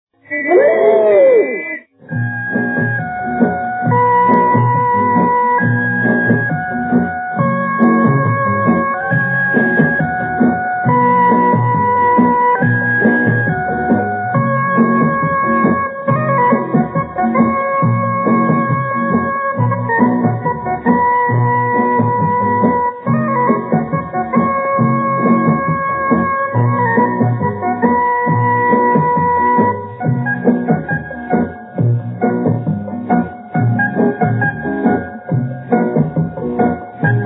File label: Title music; 1966 Street Singer; Suraj